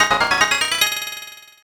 Jingle Miracle; Zekkyou Senshi Sakebrain

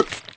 Pop

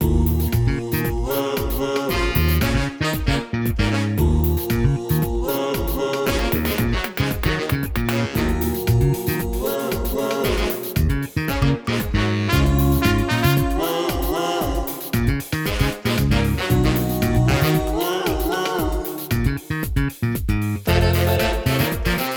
funky; maskenmann

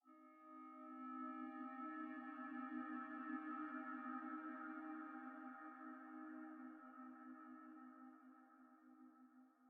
Env Field Night Cave

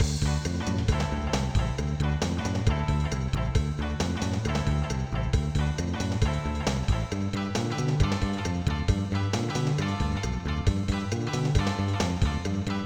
Start; Himitsu Kessha Q; PS1

